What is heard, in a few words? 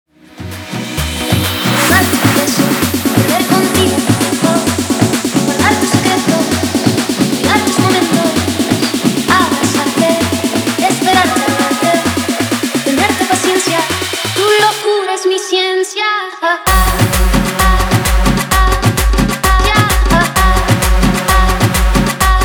guaracha remix 130 bpm